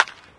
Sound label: baseball close program; sound schemes